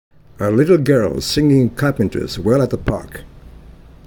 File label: a little girl singing